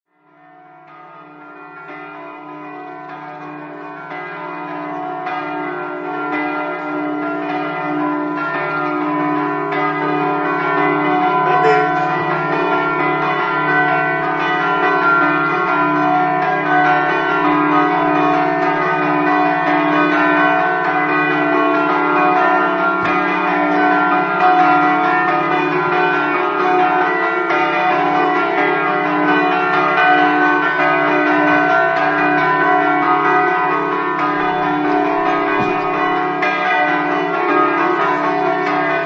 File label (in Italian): orsaria, campane